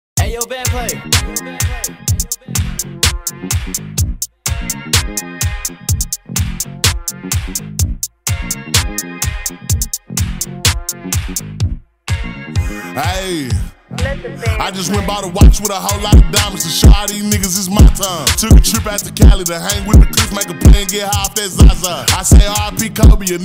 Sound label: Bass Boosted